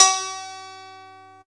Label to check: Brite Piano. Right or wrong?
Right